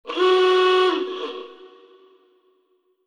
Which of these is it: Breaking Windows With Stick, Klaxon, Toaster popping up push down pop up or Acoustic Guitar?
Klaxon